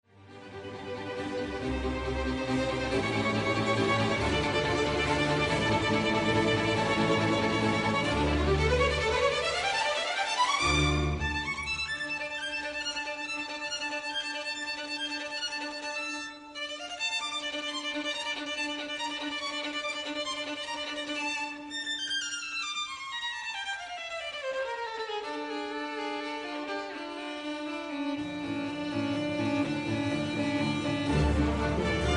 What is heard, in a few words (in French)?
Orage Alternance tutti solo